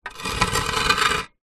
household plant pot heavy stone move slide concrete; zapsplat